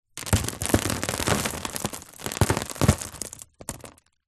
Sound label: foley dirt drop onto hollow plastic